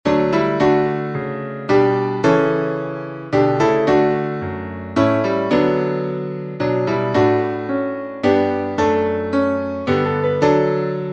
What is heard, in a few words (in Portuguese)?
salmo instrumental